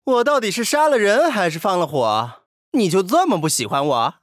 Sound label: death; csgo; kirito